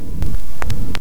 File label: loop, techno atmos